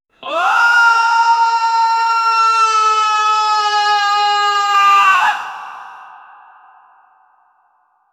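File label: heavy growl man scream; anguish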